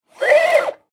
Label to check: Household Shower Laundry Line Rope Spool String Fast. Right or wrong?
Right